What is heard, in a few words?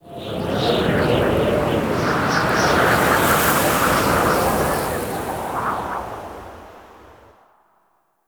Exterior Wind Gust; Warframe; Duviri Murmur; Ambience